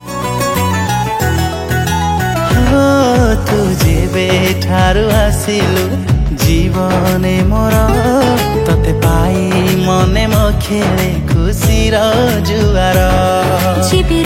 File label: Romantic Song